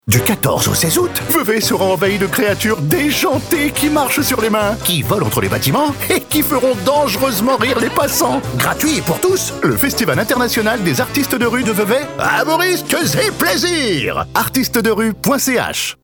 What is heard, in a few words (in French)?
spot decale